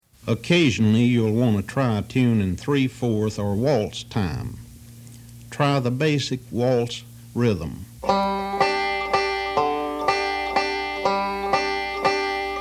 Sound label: Waltz